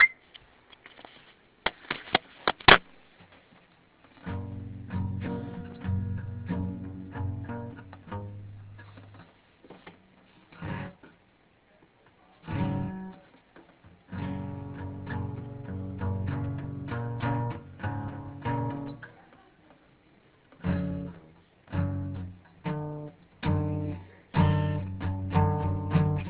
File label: Idea guitar constructing; plasticity crunch